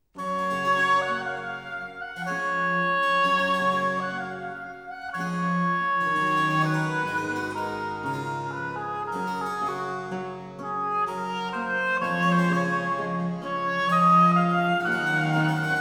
Sonata for oboe & continuo in E minor; Essercizii Musici No. 11/21; Grave; Vivaldi, Telemann; Oboe Sonatas

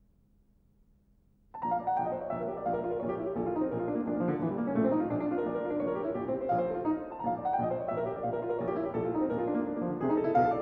Presto for piano in B flat major; Poulenc; Piano Music

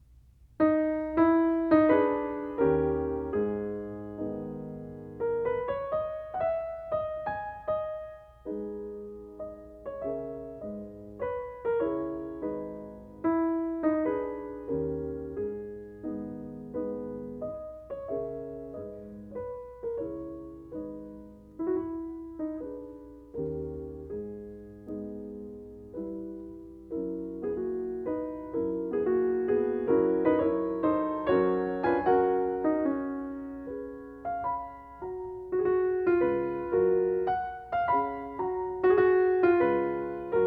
Mazurkas for piano, G sharp minor; Chopin

